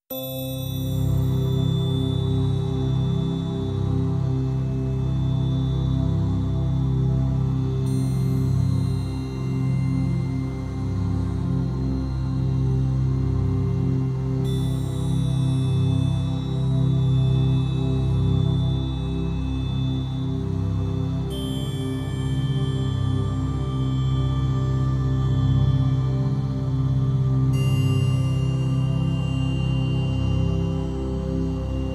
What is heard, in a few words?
Relaxing Theta Waves Binaural Beats